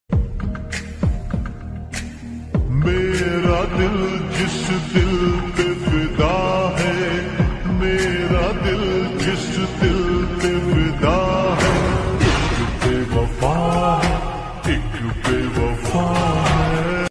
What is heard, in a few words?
attitude ringtone; sigma male ringtone